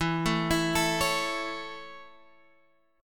C sharp Minor Sharp 5th, guitar chord

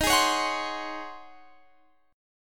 Db Major 7th Suspended 2nd Suspended 4th Eb

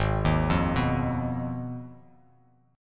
Ab Minor 6th, Abm6 chord, guitar; Abm6 arpeggiated; JGuitar

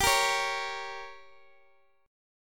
G Diminished, Guitar Half Step Down

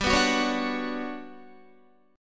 G Major Sharp 11th, Guitar; GM#11 strummed

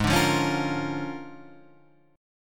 Bb 7th Sharp 9th Ab

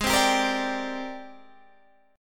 Ab Major Sharp 11th Ab

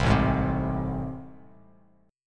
A Minor Major 7th A down, Bass; strummed; AmM7; 8,0,6,5; Bass Standard; JGuitar

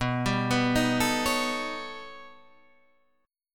B Augmented 9th B down Guitar Open D

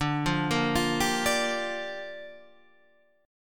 Bb 6th D

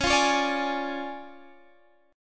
D Minor Major 7th Flat 5th C sharp, guitar

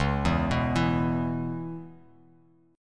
Db Minor 7th Db, Bass Standard; arpeggiated; JGuitar

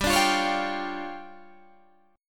D Minor Major 7th Double Flat 5th, G

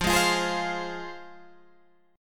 F Minor F down Guitar Open G

